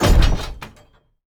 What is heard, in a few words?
mining quarry deploy; Rust